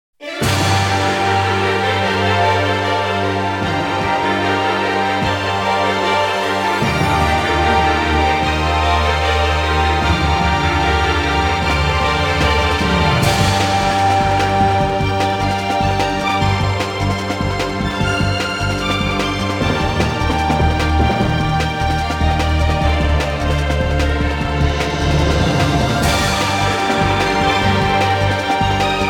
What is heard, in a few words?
Battle; Dreamcast; Skies of Arcadia